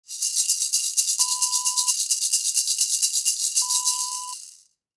baby rattle

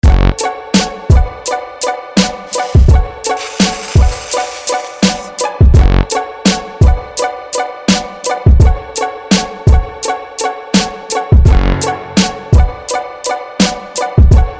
kit

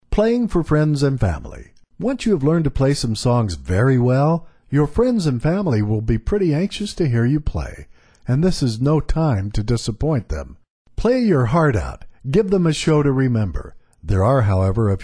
piano

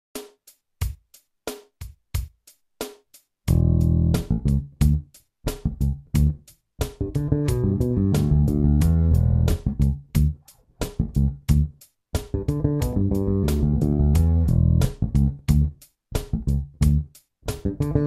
Em Pentatonic fill with open strings

